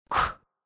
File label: blockstun